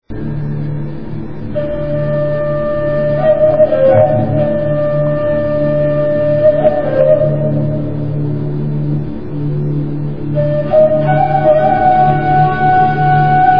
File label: ney, Africa musica; Etnologia